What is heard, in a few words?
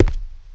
Walk Ceramic; Player; Footsteps